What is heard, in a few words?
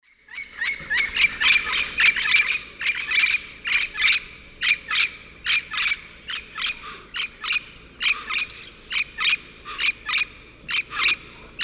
Amazona amazonica, flight calls; S. America; Eastern Lowlands